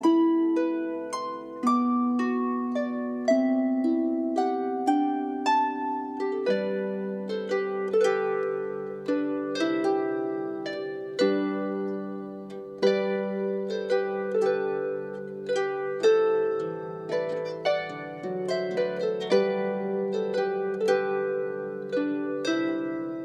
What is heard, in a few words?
celtic lullaby